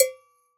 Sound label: Cowbell Black; World Percussion; Cymatics; Emperor World Percussion